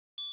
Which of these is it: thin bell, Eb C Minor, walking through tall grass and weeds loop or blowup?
thin bell